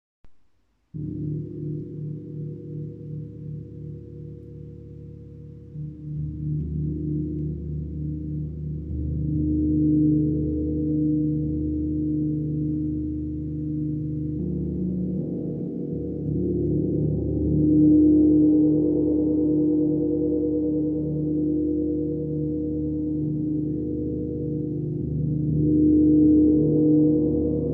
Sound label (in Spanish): 7 metales